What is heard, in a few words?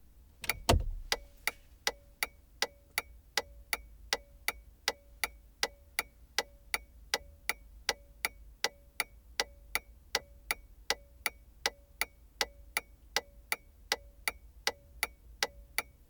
Indicators; Mkh30 40